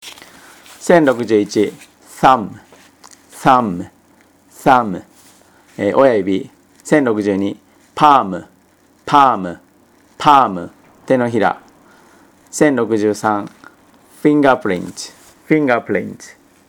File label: tango